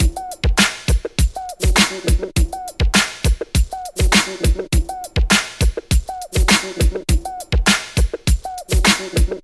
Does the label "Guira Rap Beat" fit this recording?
Yes